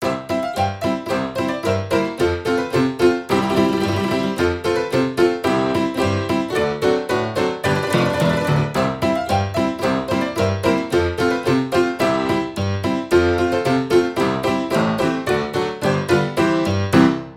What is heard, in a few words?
Saloon Piano